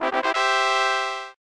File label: Fanfare